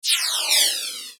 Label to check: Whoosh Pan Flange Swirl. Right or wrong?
Right